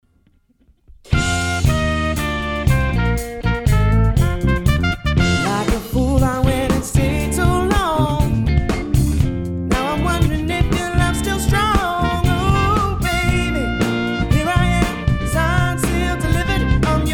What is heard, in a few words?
Motown Live